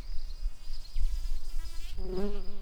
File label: bumblebees, Hypnorum workers